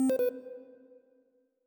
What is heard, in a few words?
Panel Fans Code Revealed; Among Us